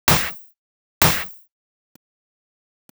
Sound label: Clap Snare